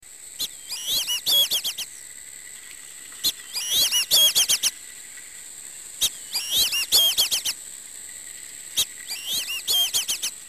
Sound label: black bellied whistling duck